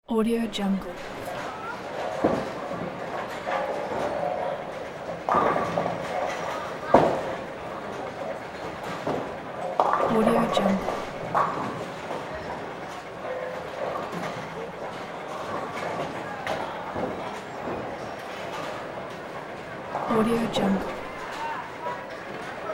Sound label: bowling alley ambience; sound-ideas